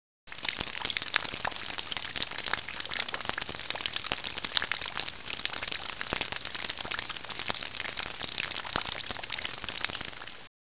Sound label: frying